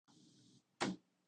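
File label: udarnij stol tihij udar, table hit, quiet hit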